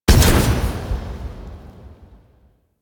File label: Destroy; Cinematics; Shrine Intro; Sentient; Warframe; Sacrifice